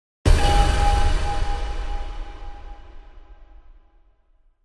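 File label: Impact Double Metallic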